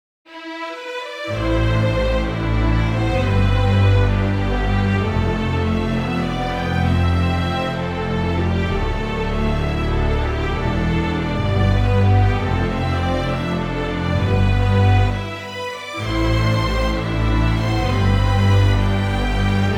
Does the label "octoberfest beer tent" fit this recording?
No